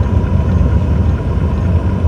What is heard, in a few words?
relay activated loop